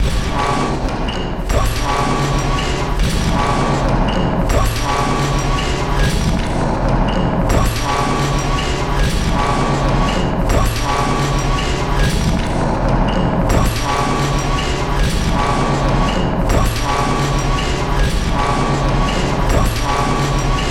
Factory Machine

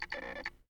Digital camera

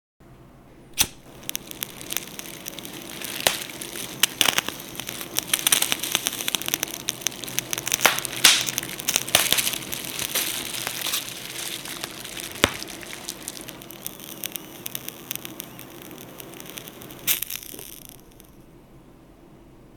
Fire

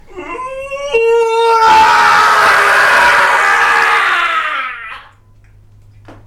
Gut Wrencher

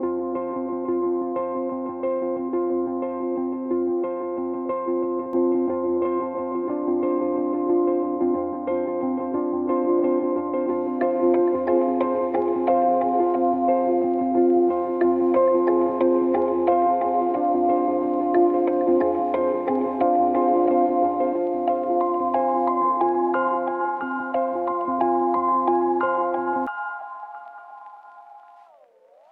Ambient Guitar Soundtrack

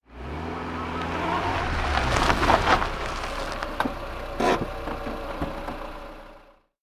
Fast Reverse Car Stopping On Dirt

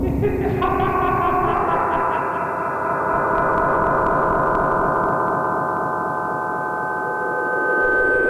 Spooky Spring laf

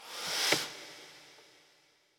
Breath

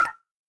Basic Click Wooden

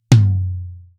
Pearl Mlx Tom

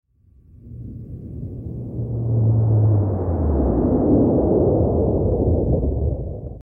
Large Spaceship Pass

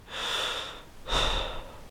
Sigh

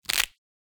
Bone Crunch

